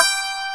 YANG-CH'IN; AKAI S6000 CD-ROM - Volume 1

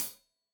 Hat Tight, Hi-Hat, Cymbals; Deeply Sampled Drums

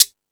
Percussion

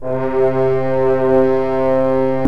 FRENCH HORNS; OMI - Universe of Sounds

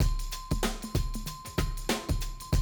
Classic DrumLoops

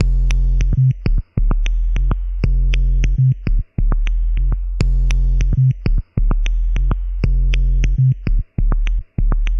Square Beat, Electronic Beats; Sonic Foundry (Sony Creative Software); Myths of Technology

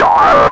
bass, fx & sweeps; EZRollers

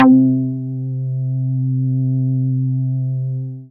C4, Synth Line; 90 s Sample CDs; NiceBeats; SynthLine VOL-32

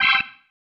Stab, Organ, One Shots

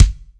AMKICK; AKAI S-Series CD-ROM Sound Library VOL-1; AMB DR

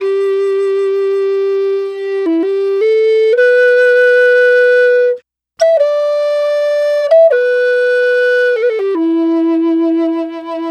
Native Flute, Native American; Sonic Foundry (Sony Creative Software) - Continental Drift Aftershocks

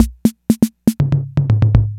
INTROS FILL, DRUMS PERC YAMAHA; Rubber Chicken Software Sample Bank

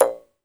jaw harp, Pitched Percussn, Jaw Harps; E-MU Producer Series Vol. 7 – Old World Instruments

